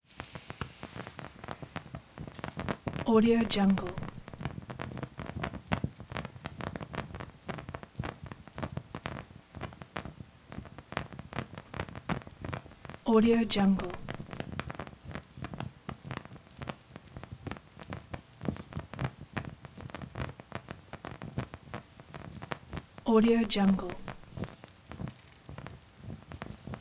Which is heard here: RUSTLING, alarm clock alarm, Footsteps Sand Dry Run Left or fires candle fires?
fires candle fires